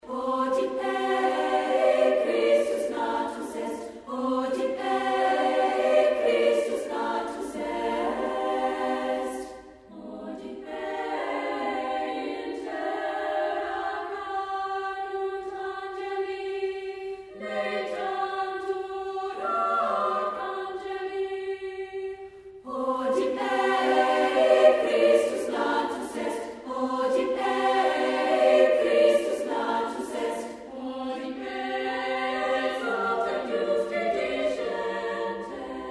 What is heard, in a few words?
Hodie Christus Natus est SSAA